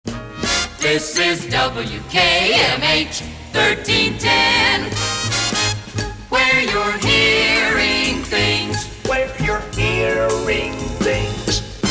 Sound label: Jingles; WKMH-AM; Where You're Hearing Things